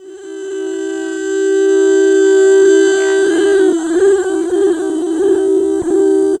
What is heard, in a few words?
VOX, 150 BPM; Ueberschall; Drum & Bass Resonance